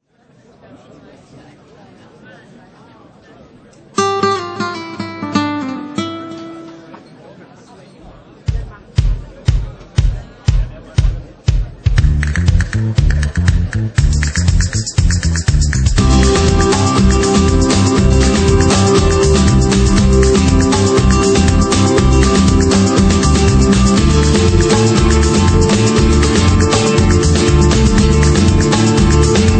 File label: Ritmo Soleado